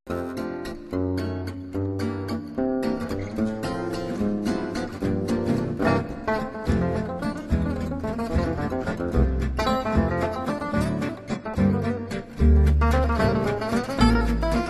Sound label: Swing Valse; Jazz Tsigane